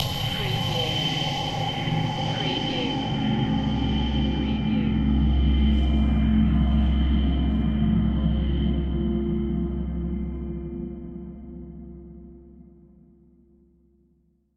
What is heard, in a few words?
Hit Metal